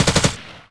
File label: heavy m gun, Machine Gun Audio